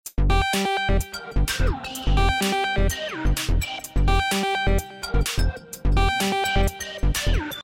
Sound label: Loop 127; asplosion